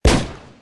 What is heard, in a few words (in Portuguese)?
tiro; riverraid